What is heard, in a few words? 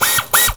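Air ratchet, air tools, industrial; Air toolks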